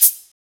shaker percussion G key, Loudest frequency: 10321Hz